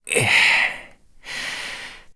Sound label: Link Carry Stamina Low; carrying a heavy object at low stamina; Skyward Sword